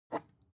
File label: object bottle glass pick up short